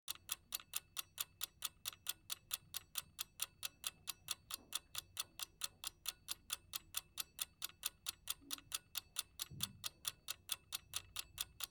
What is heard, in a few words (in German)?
Weckerticken; Haushalt